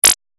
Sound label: lazer